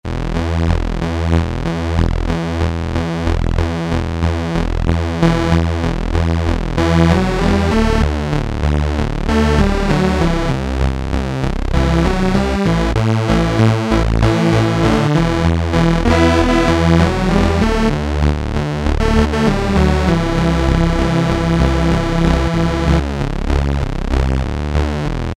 Synth 4 - Super Detune, techno style, Crumar Trilogy